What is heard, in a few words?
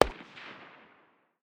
ak 762 far distance; tacz-tweak-pack; taczmechanics